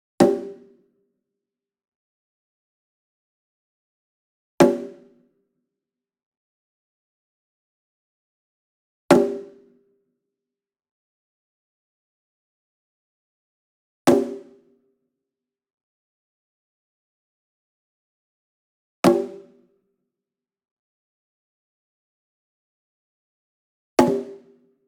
ENSEMBLE DRUM Shime Daiko And Chinese Tom Hit Near; boom library; Cinematic Strikes Construction Kit